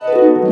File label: Ball Appear